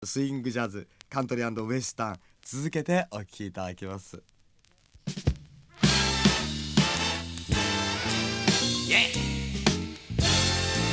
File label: SWING JAZZ